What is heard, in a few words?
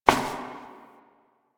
Step Perforated Metal; Warframe; Koumei